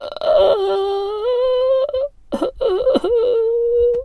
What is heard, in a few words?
sad sad